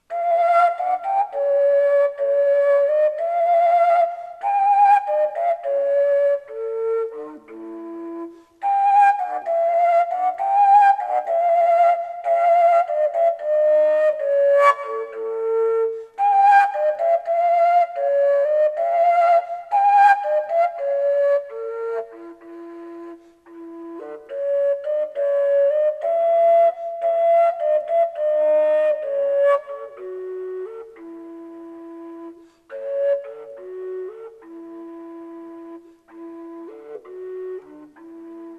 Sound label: a plain, fujara